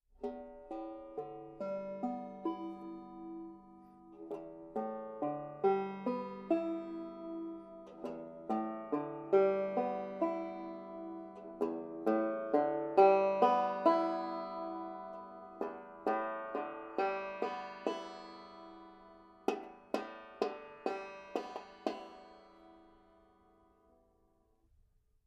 leere saiten piano, banjo

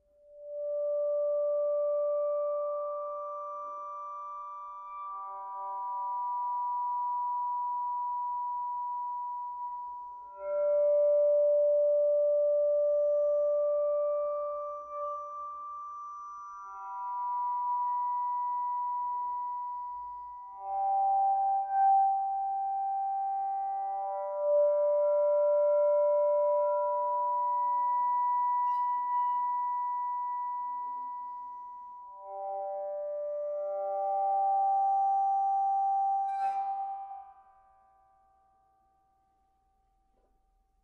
e-bow obertoene, banjo

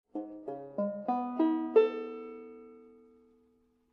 viertelton akkord, banjo

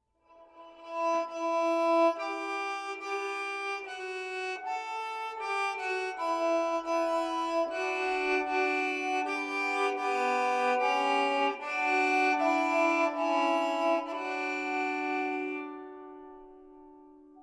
bogen, banjo

